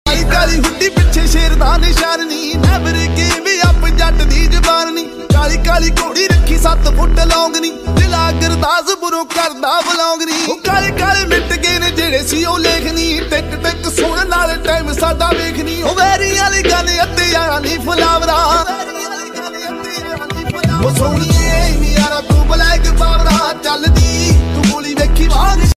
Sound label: Punjabi Beats Ringtone